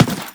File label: Run Default, Footsteps; Warframe; Teshin